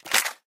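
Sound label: inventory items ammo; STALKER; IWP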